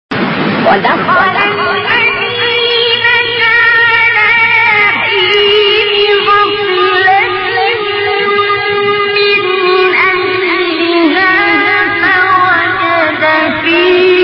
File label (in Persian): زیبایی تلاوت آیه سوره; قصص; عبدالباسط